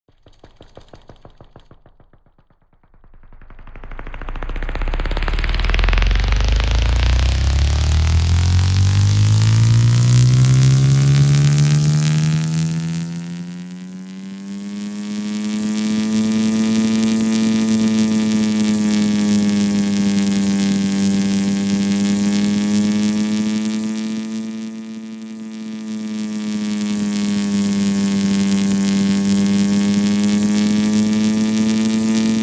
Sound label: atmosphere little airplane